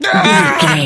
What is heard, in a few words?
voice male death